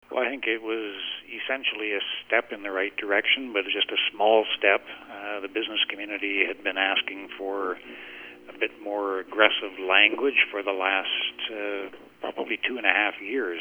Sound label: Tom